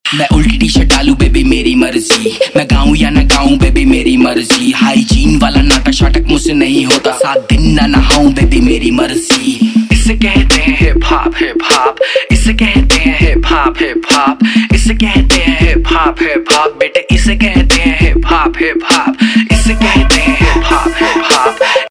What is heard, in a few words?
Hip Hop, RAP Ringtone